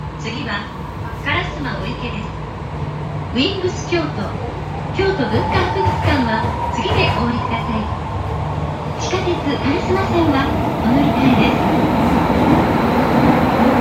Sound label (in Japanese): 京都市営地下鉄東西線烏丸御池予告, train sounds